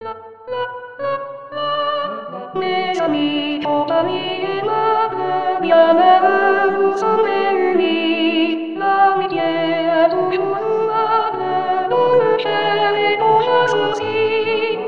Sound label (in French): retrouvailles s a